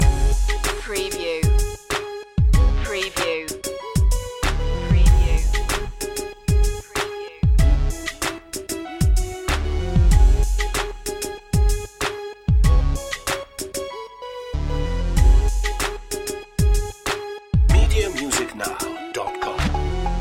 hypnotic hips loop

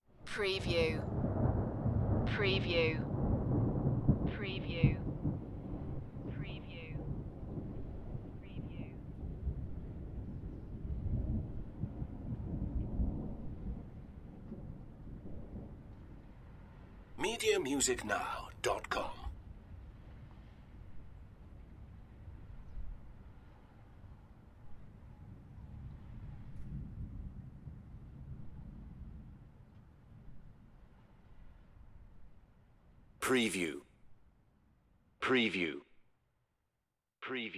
Thunder Distant Thunderclap Long Rumble Tail

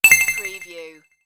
Digital Chime Echo Engage

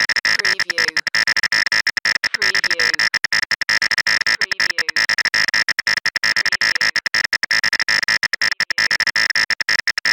Computer Readout

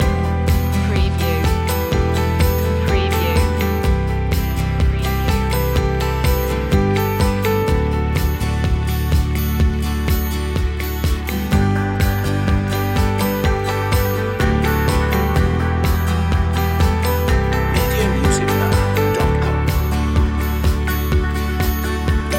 summer in june loop

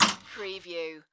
Pinball sound, metal clank with slide

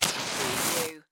Match Strike Flare

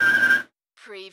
Car or motorcycle brake, tyre screech

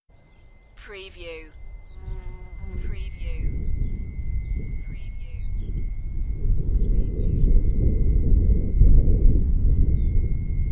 Windy with noisy wind turbines; beanstalk audio

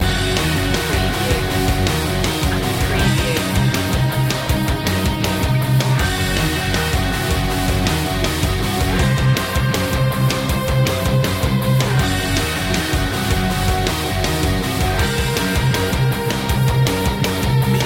aggressive action loop

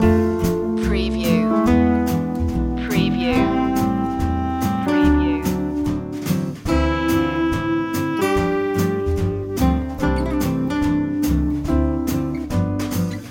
come swing with me loop

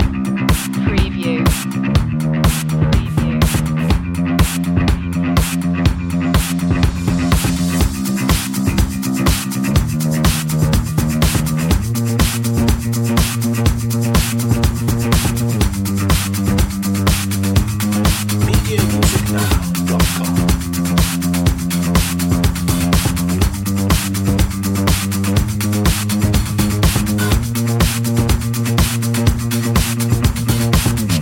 streamliner loop

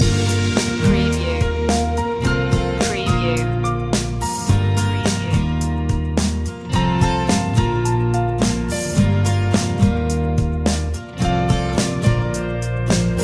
brighter day loop

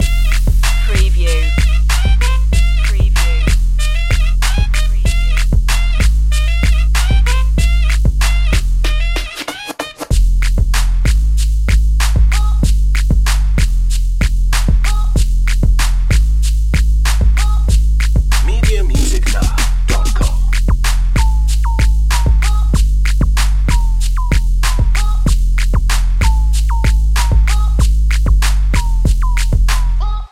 beat the sax loop

